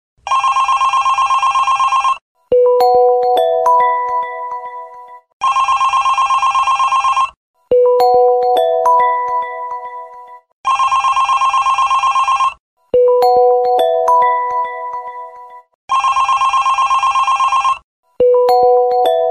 Ringing

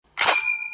M1 Garand Ping

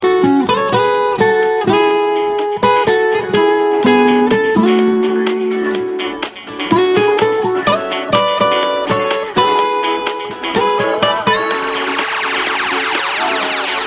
Dream Guitar

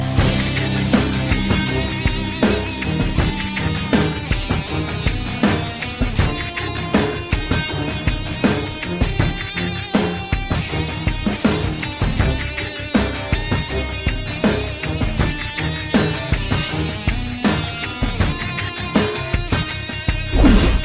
Bgm; Billa 2

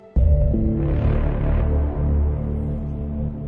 Menu sound; MEA

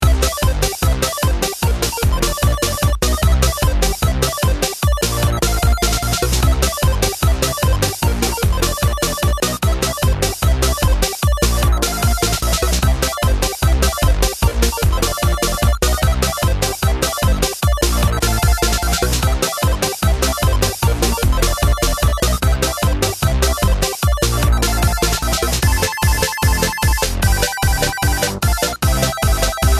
Hoedown

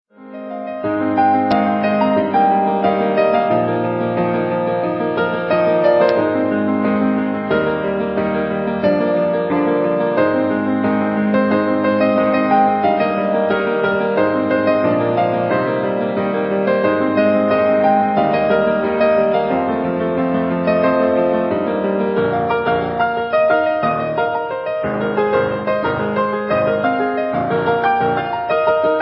Piano Music

